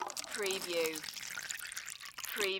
Water Pour Liquid; Sound Effects Bible

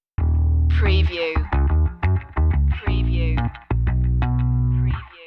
night ride stinger

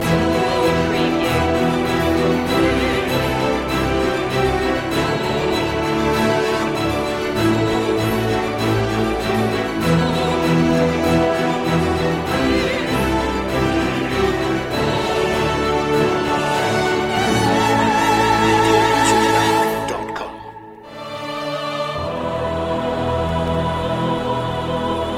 no perc

